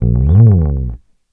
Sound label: Bass one shot Slide FX Dark, Electric Bass; Slate Digital BASSHOLE Sample Pack